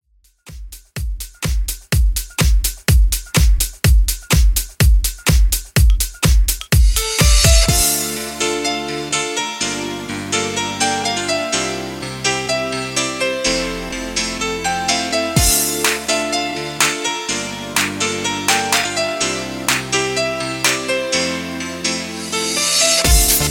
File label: Disco Classic Mix 125Bpm; djyan